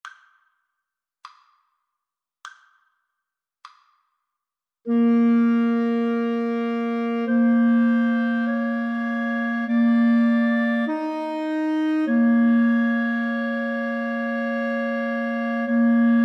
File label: Clarinet Trio